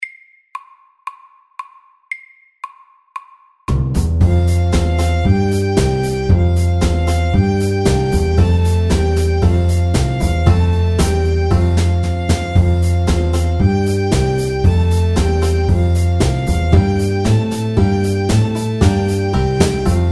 Square dance MIDDELS

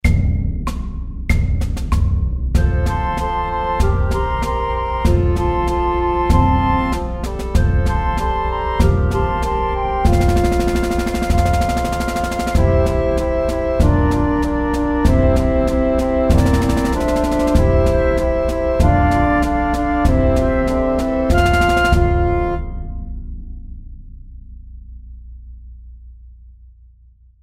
Aspirantmarsj

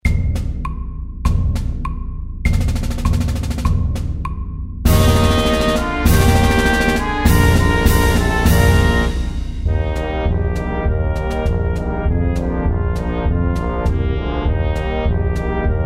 Marsj, 100

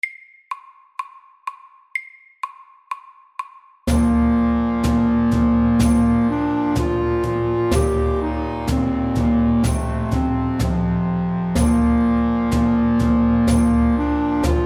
engelsk melodi FAST

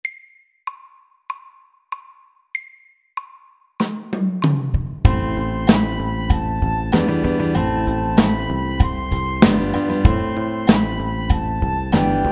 Crazy beat MIDDELS

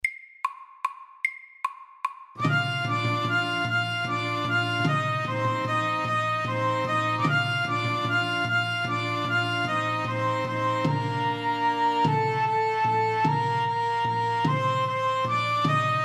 Irsk melodi FAST

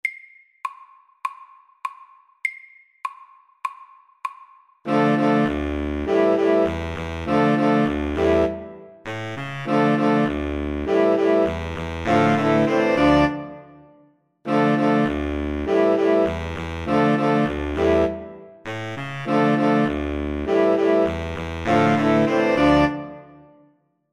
Jam SAKTE

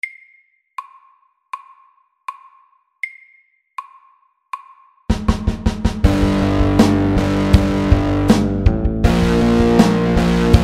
Pirate rock SAKTE

